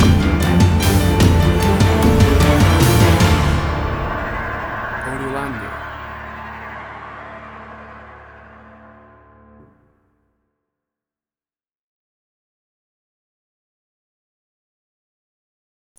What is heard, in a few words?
Action Trailer Music Cue Stinger